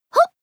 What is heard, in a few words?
jump; ganyu